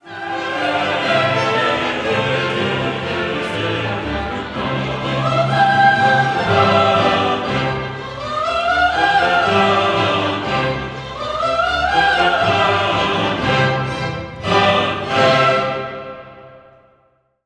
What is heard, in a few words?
Credo Amen